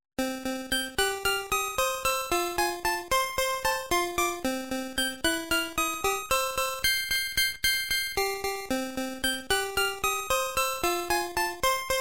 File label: nintendo musicbox